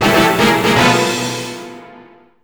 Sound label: Jingle Check; Vampire Rain: Altered Species; PS3